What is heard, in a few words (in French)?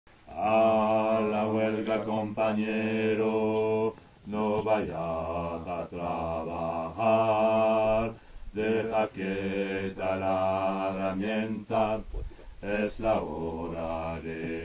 basses